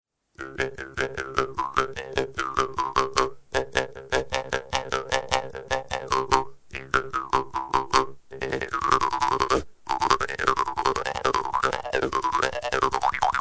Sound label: Guimbarde